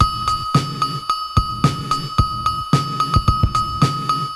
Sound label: 80s Gated Verb Korg MR-16 (110BPM), cyborg beats; musicradar